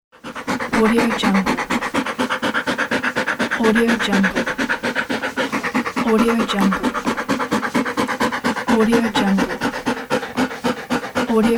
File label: dog panting; نفس نفس زدن سگ